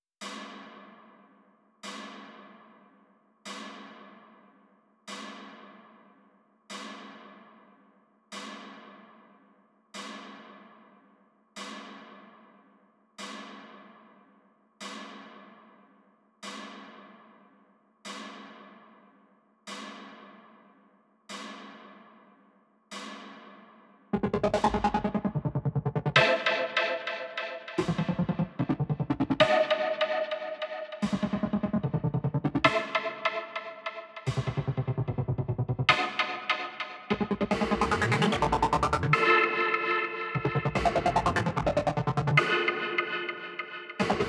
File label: Precipation Synths 148